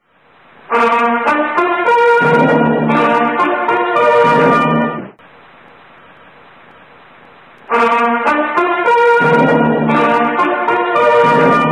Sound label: interval signal; brass section; reichssender berlin 1935